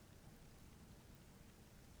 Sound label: pulses, BALAENOPTERA; MONACO 2022-07